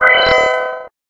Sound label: win; mini drift